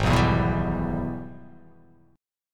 G sharp Minor Major 7th Sharp 5th G sharp down

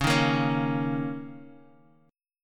Bb Minor Double Flat 5th Db

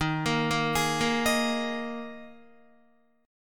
Eb Suspended 4th Eb Guitar 4F 4A 5C 5G 5C 6E; Ebsus4 arpeggiated; JGuitar

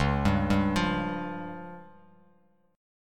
C sharp Major Flat 5th, Bass Standard; C#Mb5 arpeggiated; JGuitar

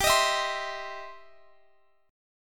E Minor Major 7th Sharp 5th G

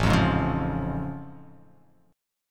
A Augmented Major 7th A; strummed; 5,8,6,6,5,x,x,x; JGuitar

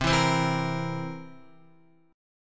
C 7th C down Guitar 4F, 4A, 5C, 5G, 5C, 6E; C7 strummed

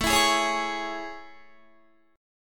A Major 7th A, Guitar; AM7; strummed

